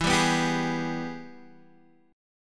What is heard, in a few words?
G sharp Minor Major 7th Sharp 5th E